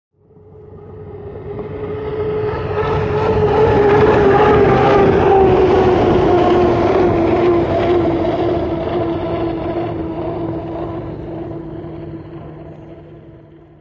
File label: Hydroplane By Fast